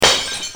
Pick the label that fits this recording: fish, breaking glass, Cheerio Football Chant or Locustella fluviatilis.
breaking glass